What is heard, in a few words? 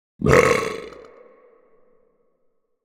drunken rage burp; gragas